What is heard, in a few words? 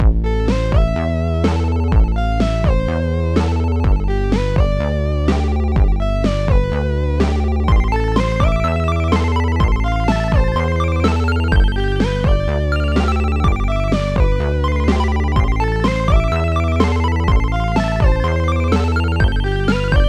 rumba; rumba nr.2; MusicLine